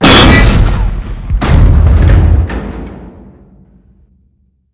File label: Strider Buster detonate; weapons